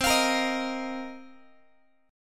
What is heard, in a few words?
C Minor 11th C Guitar; Cm11 strummed; x,20,20,20,19,x